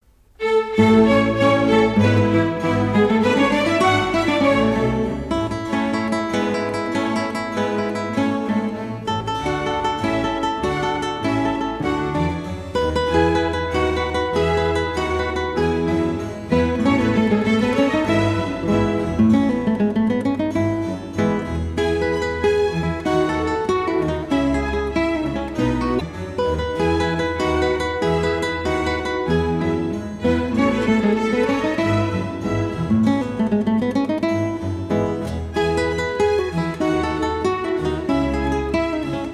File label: Guitar Concerto in A Major; RV 82; III Allegro